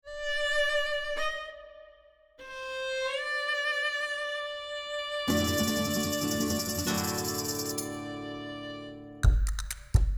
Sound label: flourish dance